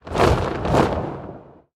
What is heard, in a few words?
Attack Sound